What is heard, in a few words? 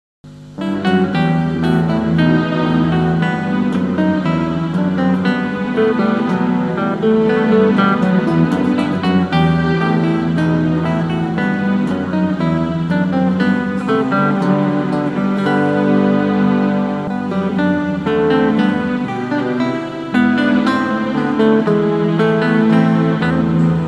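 Bach nylon guitar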